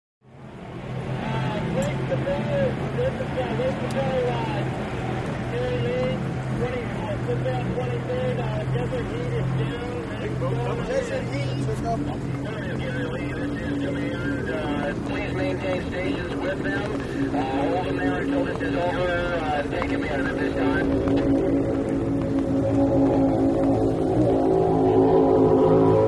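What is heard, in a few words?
Power Boat Race Ambience, off shore power boat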